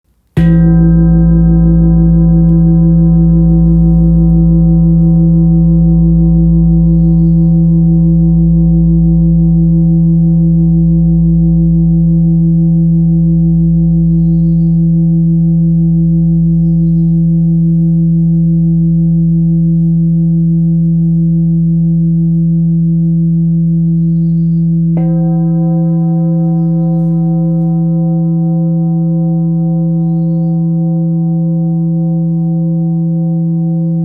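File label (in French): Bol grave 7chakras yoga mi, bol chantant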